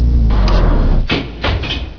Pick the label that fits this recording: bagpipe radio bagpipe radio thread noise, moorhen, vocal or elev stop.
elev stop